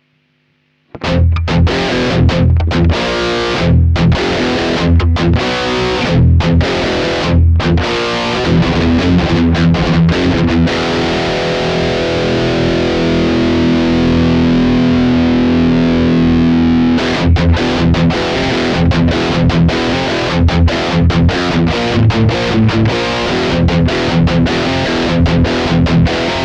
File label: puldin dirty, guitar